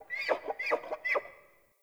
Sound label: Freed Fawn Call; Encounters; Animal Rescue